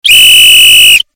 pronzitelnij nadoedlivij svist v svistok

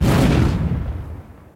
bistro razgorevsheesya obzhigayushee plamya

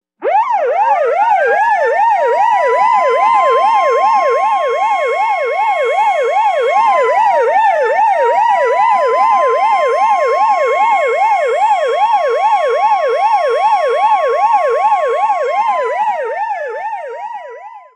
zvuk sireni policejskoj mashini

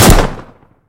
vnezapnij otlichnij metkij vistrel iz pistoleta

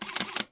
seriya foto na telefone

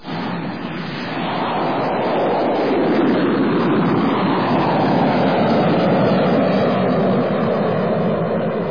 shum letyashego samoleta

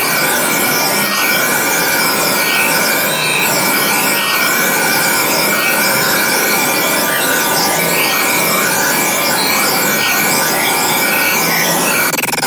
demonicheskij shum

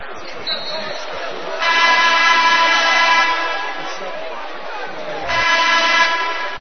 shum na basketbolnom matche

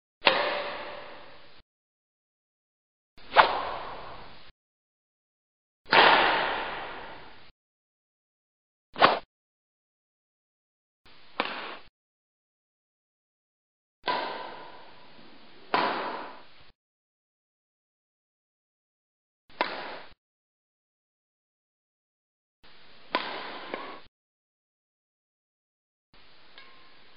badminton kacheli lovit myach razbit myach

